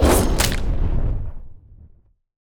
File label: rend slash fire, dragons attacks; creature; psfx